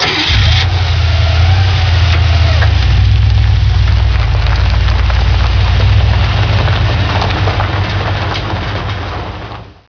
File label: train start; svencoop; hunger; hungerplats